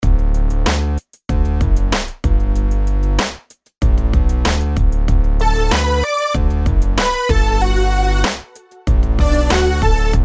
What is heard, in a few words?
rhythms simple 8s major